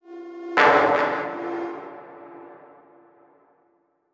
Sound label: Transition Hit Impact Short; Production Element